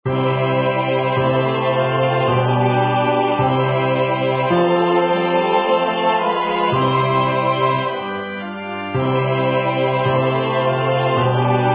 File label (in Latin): Kyrie Bass; Drahlovsky